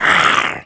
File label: death harpy, creep deaths; dota